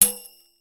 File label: HEADED WO, POP ROCK SET